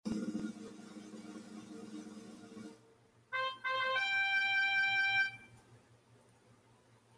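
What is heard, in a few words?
royal drum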